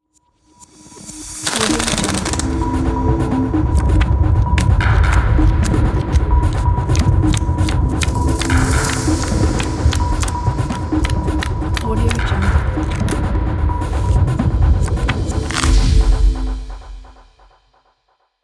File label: cards dealing focus logo intro